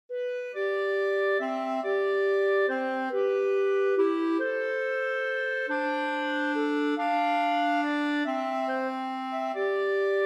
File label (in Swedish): Langfardsskridskovals